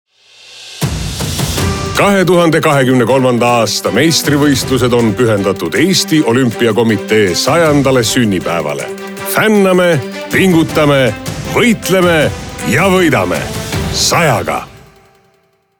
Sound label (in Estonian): kõll; EOK 100; EESTI